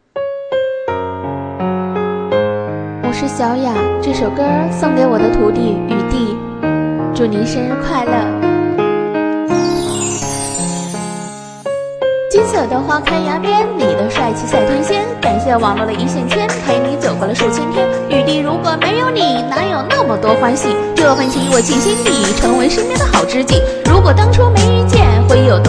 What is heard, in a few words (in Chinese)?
生日祝福 生日另类